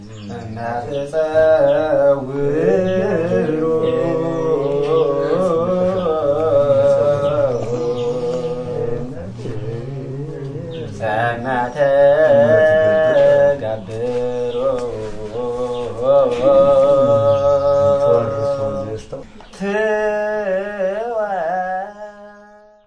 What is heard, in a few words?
christian monk chanting, MALE; MALE MISC and SPOKEN WORD